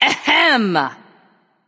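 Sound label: clear throat, female; smite; voicepack; hera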